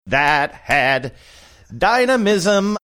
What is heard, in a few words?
clapping singing that had dynamism